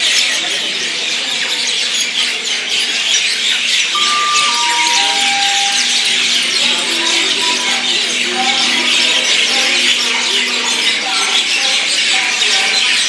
sound of the overground

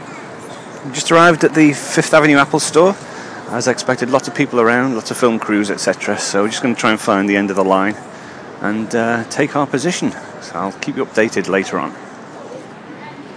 5th ave apple store

